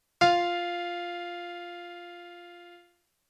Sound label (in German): Intervall; theorie; Intervalle